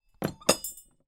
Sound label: bell school handheld put down on table